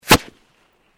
Fireworks Skyrocket Launch Close Up Isolated, Domestic Fireworks